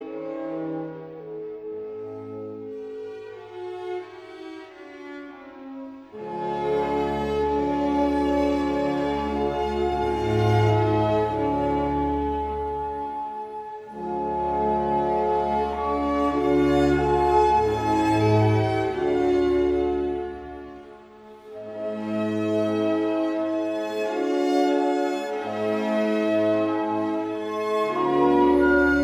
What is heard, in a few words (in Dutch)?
Adagio klarinet; Mozart; KV 622